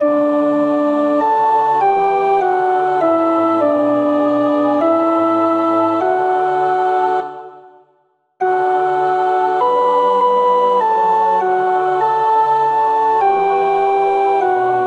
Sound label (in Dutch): Geneefse psalm 84 tenor